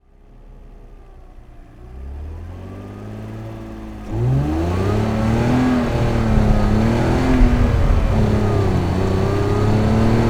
BMW E46, Cars, Vehicles, Engine, Acceleration Medium, Driving At Constant Speed; 3 Series; Andrew Vailcross